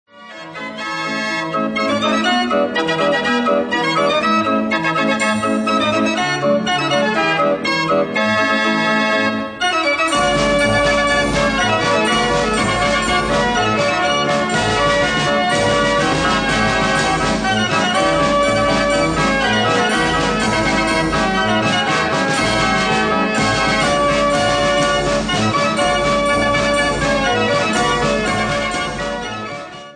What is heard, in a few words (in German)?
Kirmesorgel